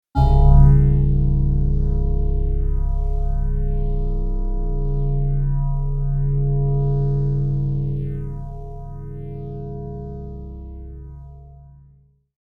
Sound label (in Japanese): kehai